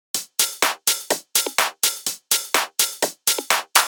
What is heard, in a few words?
Top Drum Loop; Unit Rcrds